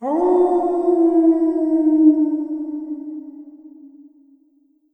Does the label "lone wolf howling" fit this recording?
Yes